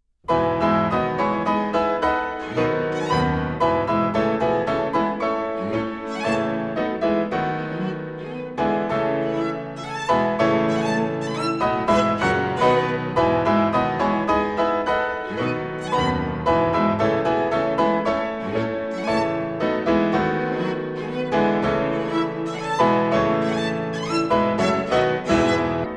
Piano Trio in A minor; Tchaikovsky; Variation 7

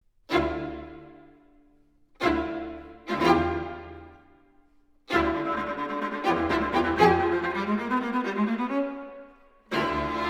Quartet, Toccata

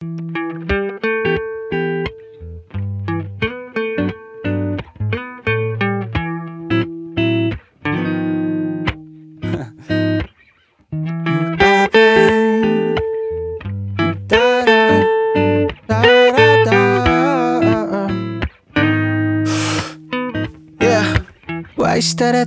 Acoustic Version

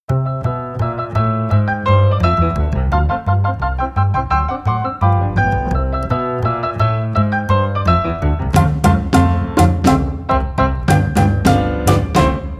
Cuba Percussion Jingle Bells

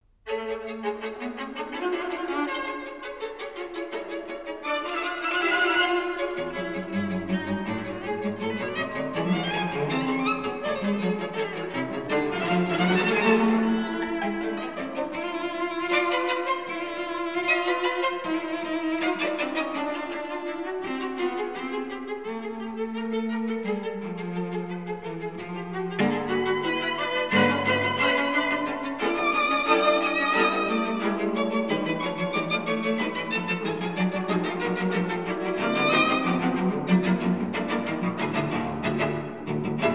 Allegro con delicatezza, String Quartet

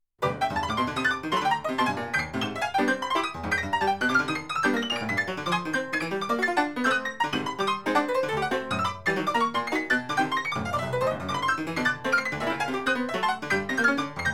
Study for Player Piano; Conlon Nancarrow

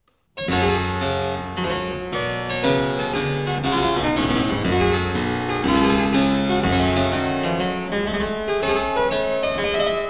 Courante

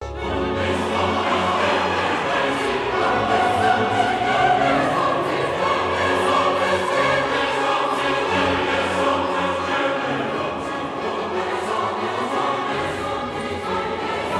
Chorus; Magnificat in D Major BWV 243 No 4; Omnes generationes

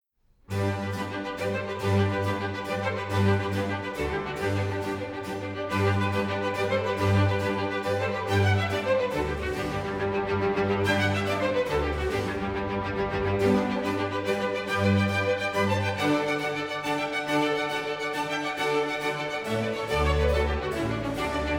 Chamber Orchestra, Concerto in G Major; Stuttgart; Martin Sieghart; RV 151

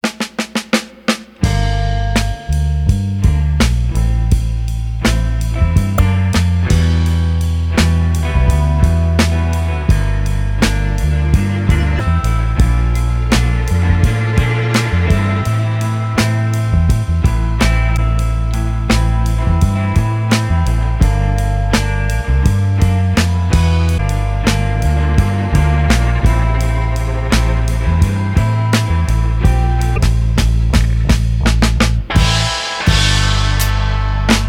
Instrumental

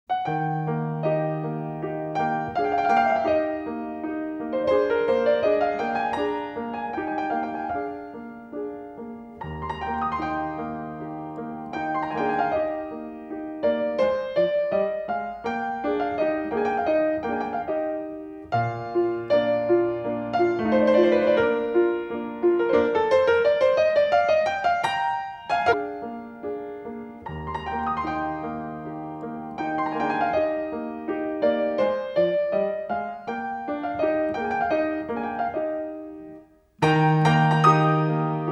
Polonaise in C piano

